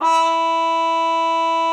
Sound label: TRUMPET, E4; Best Service ProSamples vol.42 - Session Instruments; PS-42 Session Instruments